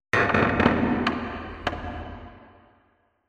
wood creak low; Half Life Alyx

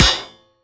metal, bullet impacts; Half Life Model Pack

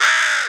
squawk pain, crow; critters

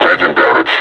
injured, suppressor

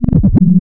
dog phrase; Half Life 2; Episode 1

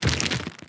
subtle, zombie blind, foley; creatures

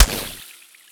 underwater impact bullet; physics; surfaces; Portal 2

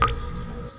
drip, ambient weather; Counter Strike Model Pack; CS:GO

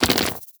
walk, antlion

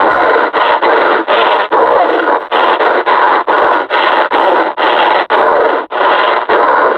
panting, suppressor

